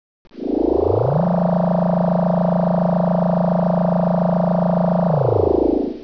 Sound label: tardis doors